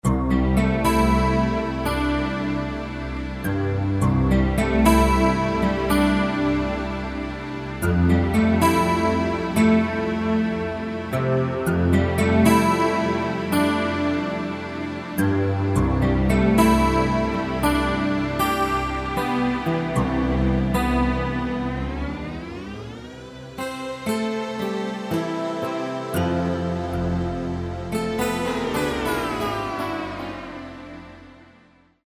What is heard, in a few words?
A. Gtr. Ensmb., Kurzweil K2000; GUITAR; Kurzweil K2000 Value Pack; Extra Programs; GUITAR1X